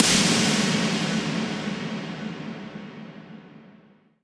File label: Impacts, Effects